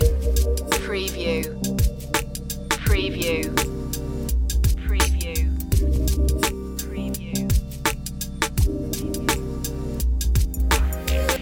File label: abstract vibes loop, chill